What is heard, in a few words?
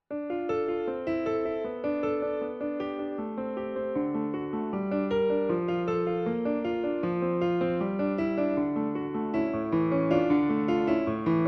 piano; Clair de lune